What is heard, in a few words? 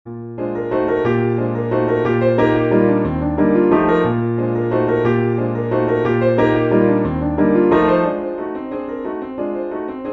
Vals, kids waltz